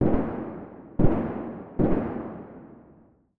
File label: Silencer AR Round Gun Fire; Nova Sound